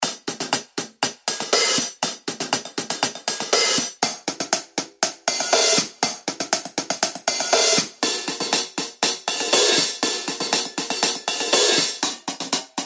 Studio Hi Hats

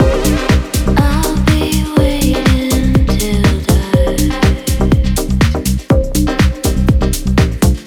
Electronic

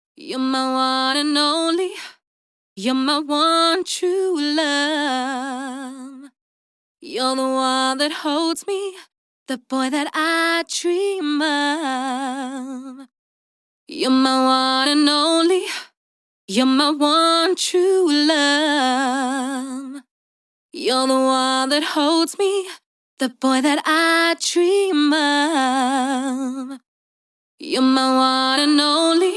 Vocal Hooks Processing Types

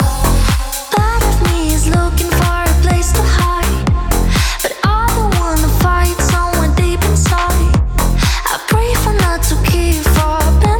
Pop

